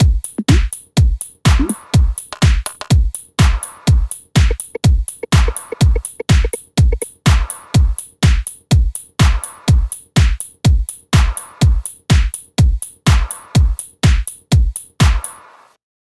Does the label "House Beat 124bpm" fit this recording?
Yes